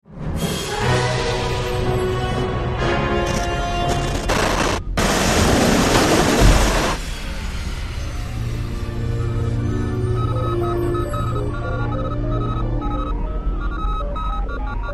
Craft Boot Left

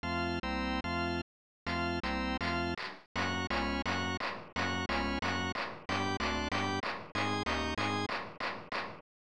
Unused Organ Music; NHLPA Hockey 93; SNES

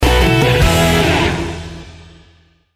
stage complete; Aqua Moto Racing 3D; 3DS

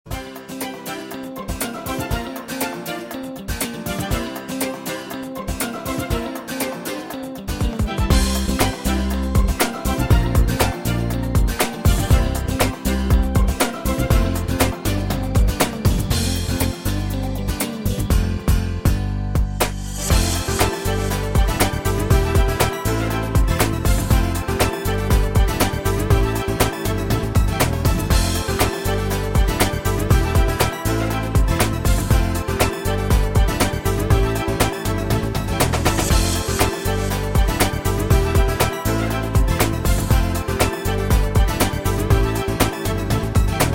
BGM Startup; Dreamals; Wii U

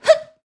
mom jump

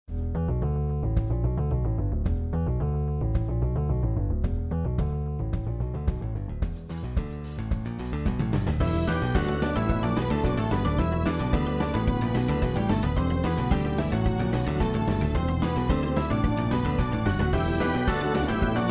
Retro

